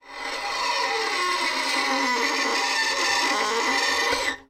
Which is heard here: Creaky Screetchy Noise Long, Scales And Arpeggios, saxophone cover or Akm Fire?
Creaky Screetchy Noise Long